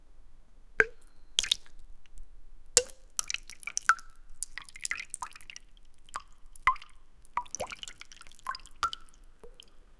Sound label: watermelon chop